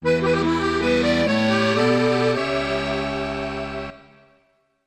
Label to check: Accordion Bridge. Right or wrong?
Right